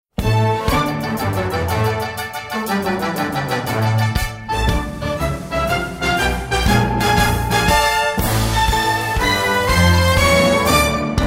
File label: transition to hooks cabin